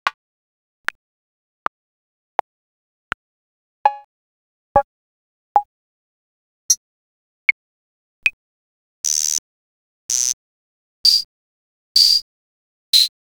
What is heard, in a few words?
Roland, drums percs; X-Static Goldmine